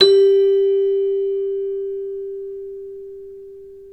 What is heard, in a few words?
CEL, ORCH PERC